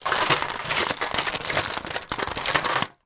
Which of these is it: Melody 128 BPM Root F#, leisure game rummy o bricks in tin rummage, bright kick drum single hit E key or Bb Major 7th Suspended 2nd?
leisure game rummy o bricks in tin rummage